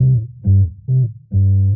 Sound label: bass, Loops Phonky